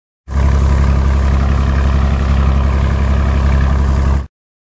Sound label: River Boat; 100 sound effects